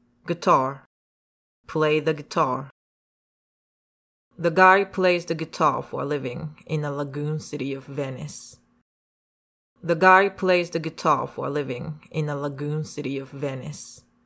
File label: guitar